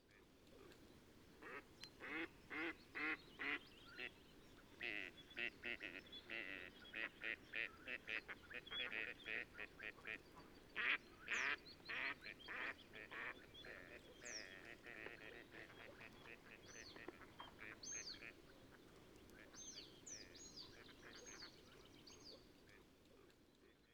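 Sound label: gadwall mareca strepera; seraya utka; utok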